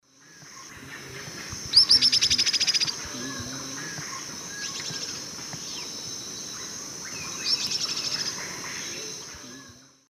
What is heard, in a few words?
Ornithion inerme, calls; S. America; Eastern Lowlands